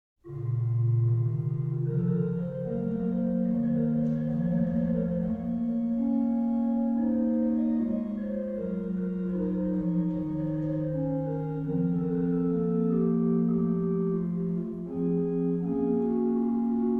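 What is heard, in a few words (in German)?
Wer nur den lieben Gott lässt walten, Bach Organ Works Chorale Preludes; BWV 691; Individual Chorale Preludes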